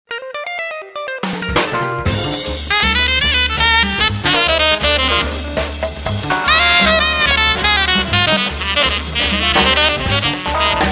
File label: Jazz bar